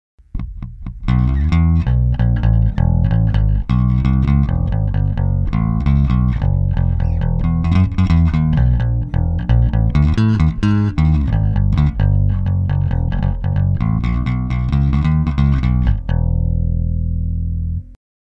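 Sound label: AFTER 132 PICK ACTIVE, ベース, Bartoliniプリアンプ; Bartoliniアクティブ・ピック弾き; TAURAMベース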